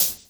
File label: Hhat, Hihat; Equipped Music; Loungin House